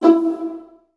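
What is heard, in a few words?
viola pizzicato E1 left, Virtuoso Strings; ILIO; Kirk Hunter Virtuoso Strings VOL-2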